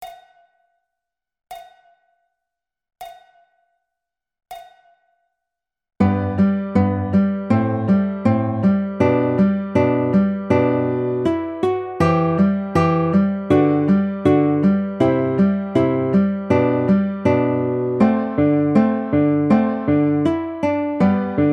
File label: Andante In G Guitar; Carulli